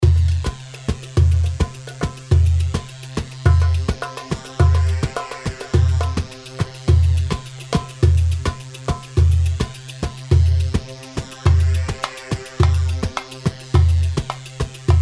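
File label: Malfuf 105 mix, Darbakati, Sharqi; ملفوف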